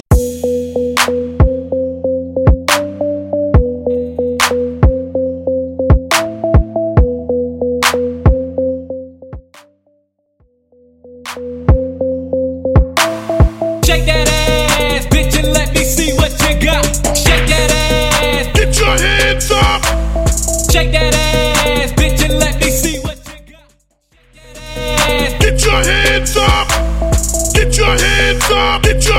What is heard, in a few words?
90s Trap RMX; Mainstream VIP; Vegas Edit